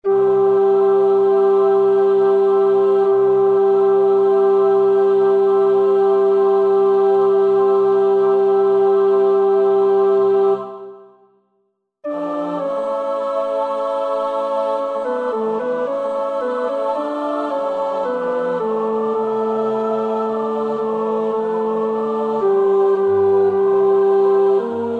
tenor 2; Rachmaninoff (Serei); Vespers Op.37

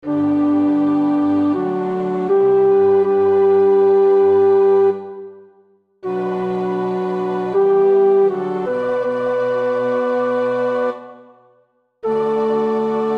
tenor; Puccini (Giacomo); Messa di Gloria

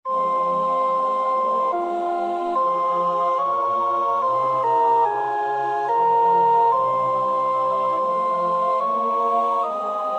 Choral sopraan; Aus Tiefer Not Schrei ich zu dir (Op.23.1); 5 Choral-Ob bei uns ist der Sünden viel

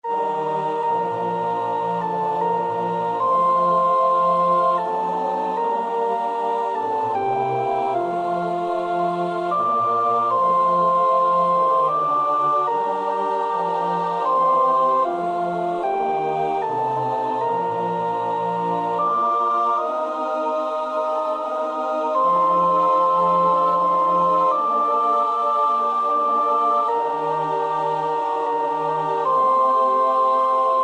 sopraan; Reger (Max); 7 geistliche Volkslieder

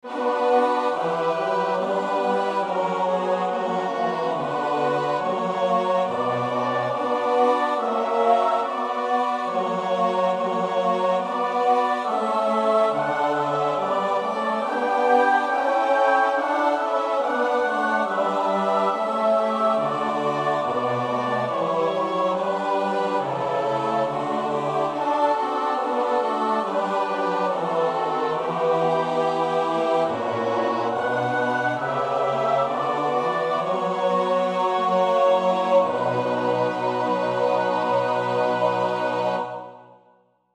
koor 1 en 2, koorpartij oefening; Bach (Johann Sebastiaan); BWV 244 Matthäus Passion

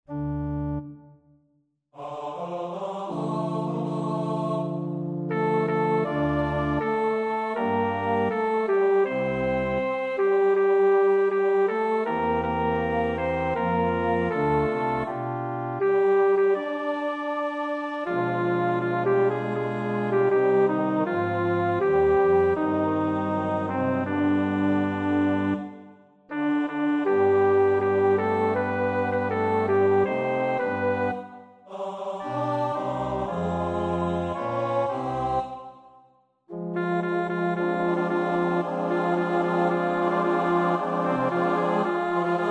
bas 1, koorpartij oefening; Somervell (Arthur); The Passion of Christ

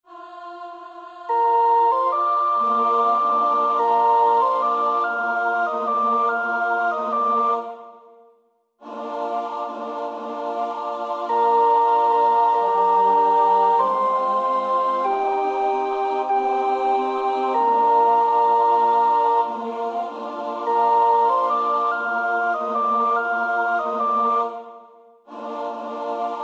sopraan 1, koorpartij oefening; Willan (Healey); Hodie, Christus Natus Est

